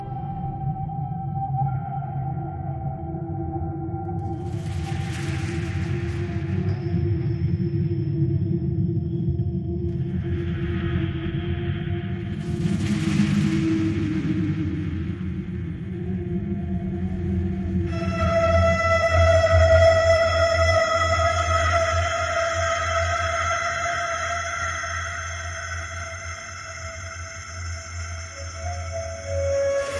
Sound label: music haunting shadows; halloween